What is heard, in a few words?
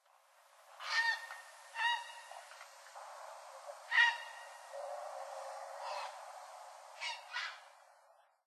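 Grey Heron; aosagi; asahikawa; koe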